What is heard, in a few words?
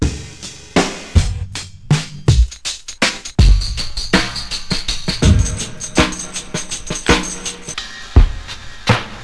beats